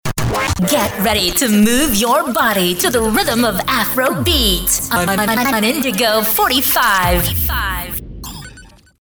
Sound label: Afrobeat